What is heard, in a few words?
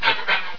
headcrab idle; svencoop